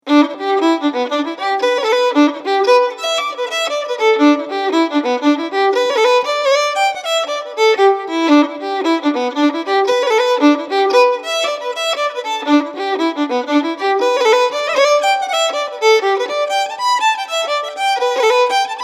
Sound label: Jig; Christy Barrys Jig